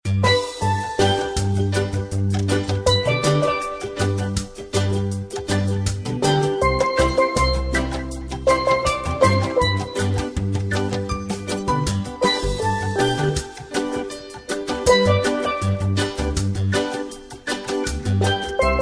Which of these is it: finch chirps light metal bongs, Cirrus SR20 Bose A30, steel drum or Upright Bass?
steel drum